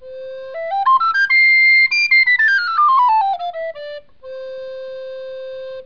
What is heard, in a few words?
recorder soprano range